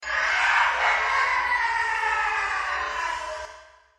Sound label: Laugh; Mad Jacks